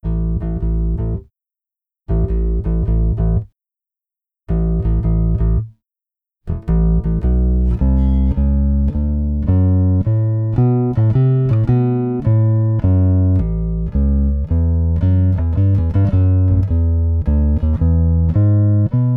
Blues Bass; Digidesign; Pro Tools Essential Loops

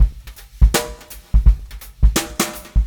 083 Pop Brush Drums; Pop Rock; Moderate Tempo; Pro Tools Essential Loops; Digidesign

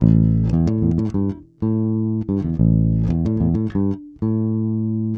092 Old School Bass A; Pop Rock; E-Bass; Pro Tools Essential Loops; Digidesign

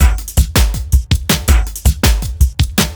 Dance Beat

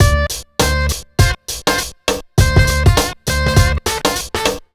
101 HipHop Funky Combo D min; Digidesign; Pro Tools Essential Loops

